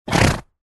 tieng ngua khit mui bang mieng

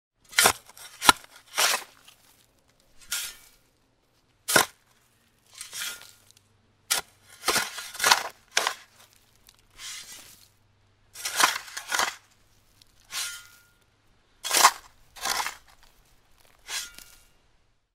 Am thanh tieng Dao Dat, tieng xeng dao dat

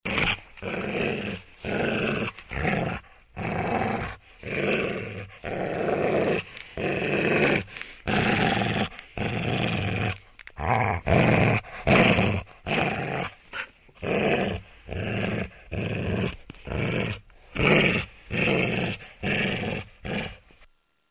con cho gam gu gian du am thanh 3d

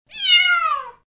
một chú mèo con trưởng thành kêu meo meo

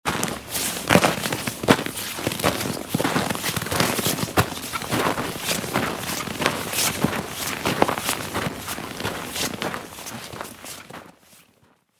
am thanh tieng buoc chan di bo tren tuyet

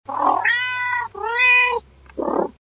chu meo vui tuoi meo meo

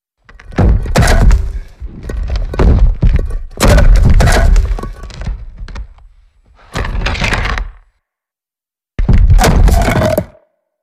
tieng di chuyen day di mot vat rat nang

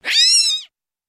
tieng doi keu khong thoai mai

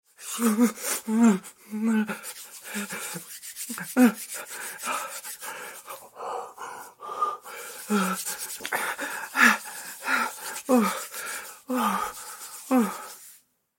tieng run ray toan than vi lanh

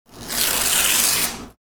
xe rach to giay cham

